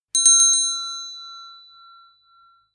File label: Convenience store opening with a bell ringing